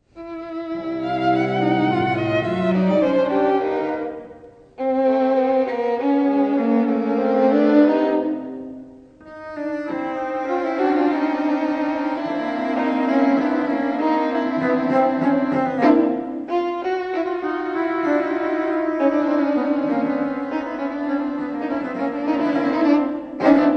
String Quartet Allegro

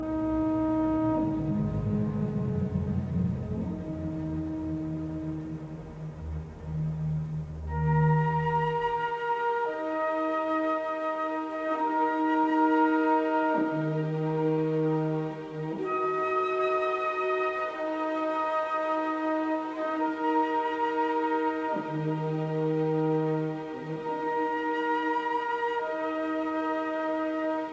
Symphony in E flat major, Bewegt nicht zu; Symphony No 4; WAB 104; Romantic; 1881 version ed R Haas

